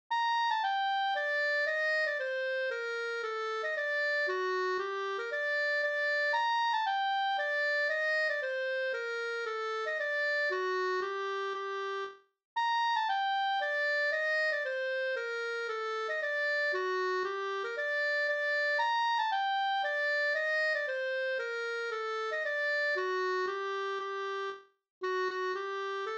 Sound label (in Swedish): mazurka; fr Gammalsvenskby